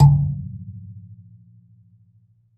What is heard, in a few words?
AFRBASMP, BASSE AFR; Univers Sons - Raricussions